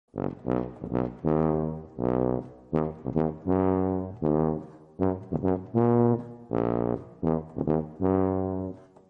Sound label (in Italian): Tuba